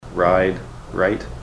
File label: ride right